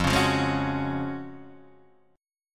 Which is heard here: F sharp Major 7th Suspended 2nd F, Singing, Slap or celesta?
F sharp Major 7th Suspended 2nd F